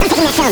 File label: VOX FILM; NES DPCM; MIDINES